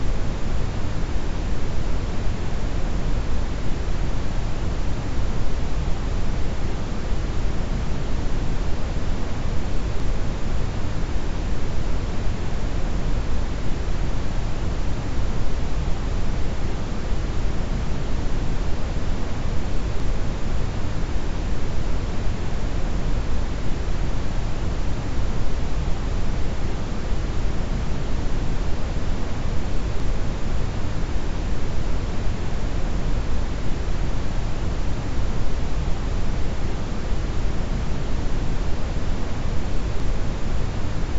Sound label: noise brown